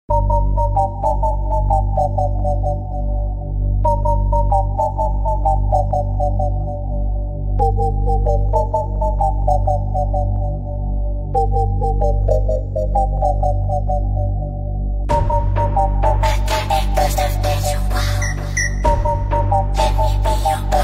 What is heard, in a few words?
Armian style remix